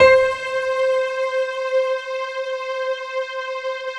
piano, Piano+String

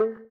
VIBRA WAH, guitar; Spectrasonics; Bizarre Guitar

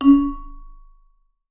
V SHRT MF C#3, Marimba & Vibes; Sampleheads; Dave Samuels

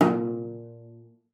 CELLO FINGER A#2; Xsample VOL-5

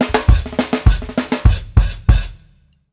OPEN HAT2 L, Drumworks FILLS 96; Sampleheads - New York City Drumworks VOL-1

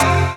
HOUSE, House Dance

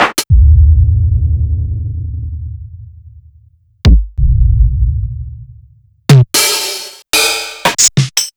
LinnDrum; M8; Sliced

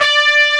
RV HORN, POP

